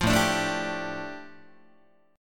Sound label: A 7th Suspended 4th Sharp 5th A, Guitar; strummed